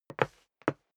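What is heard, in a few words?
step dress boots wood parquet scuff, footsteps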